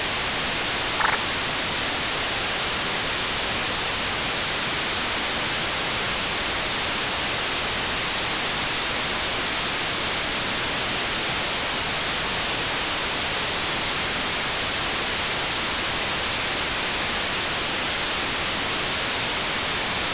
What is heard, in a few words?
FSK441 train